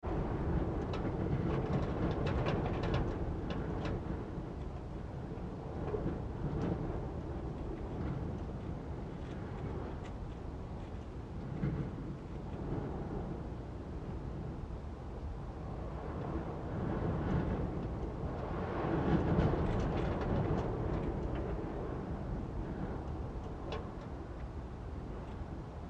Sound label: Wind Attic Space Gusts Med; Interior Attic Space; Rattles; Wind (Sound Effects:Weather); Big Room Sound